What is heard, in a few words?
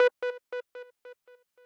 arp